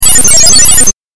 8bit メカノイズ

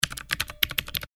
タイピング メカニカル 短

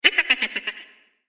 おばけの笑い声

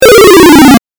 8bit 下降

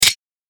銃 を 構える

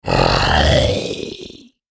クリーチャーボイス

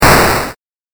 8bit 爆発

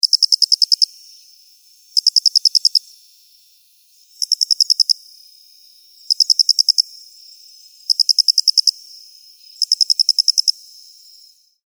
ミツカドコオロギ 長め

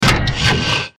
大型ロボットの駆動音

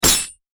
斬撃

